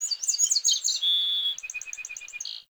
Tree Birch Bird; Trees

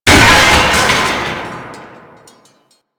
Destructable Vent Cover; Objects

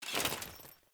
Foley Melee Swing Armour; Characters; Enemy; Melee Grunt; Sinecure

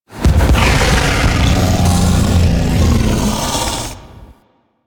Pit Monster Acid Spit; Warframe; Infested

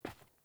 Grunt Boot Walk Default; Characters; Enemy; Melee Grunt; Footsteps; Boots; Sinecure

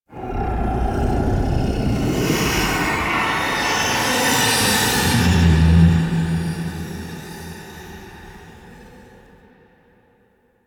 Misc Whispers Large; Warframe; Ambience; Entrati

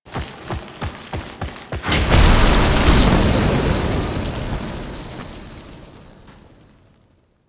Exit Foley And Jetpack; Warframe; Veilbreaker; Kahl

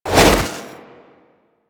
Trooper Melee Whoosh; Warframe; DE; Sentients

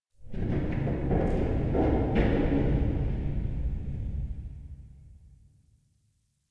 Cave Metal Tension; Ambience; Warframe; Stalker Cave

